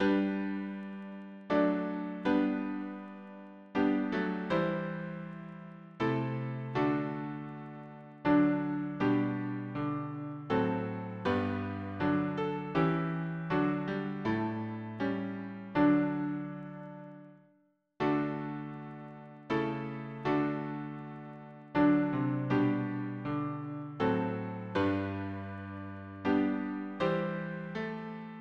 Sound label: harmony piano